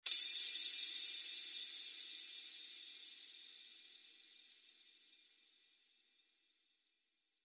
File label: Pin Drop Ping